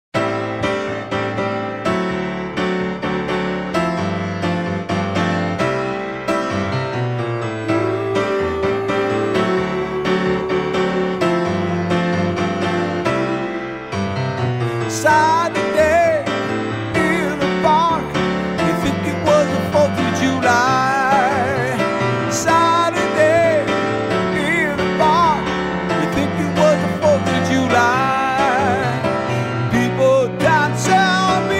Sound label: Solo Piano